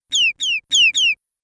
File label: Arcade Game Bird Sounds; Video Game Sound Effects; Retro Inspired Sound Effects - Think Nintendo, Atari, C64